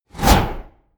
swoosh

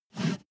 step barefoot metal slide short

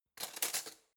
cutlery spoon drawer grab hard

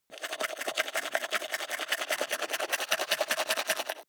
teeth brush wet open inward fast

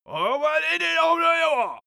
male shout angry

